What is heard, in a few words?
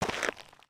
Foot Dirt Sneaker Walk, footsteps